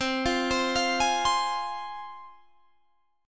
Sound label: C Suspended 4th Sharp 5th C Guitar Standard; arpeggiated; 20,20,22,22,21,20; JGuitar